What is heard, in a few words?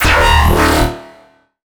bass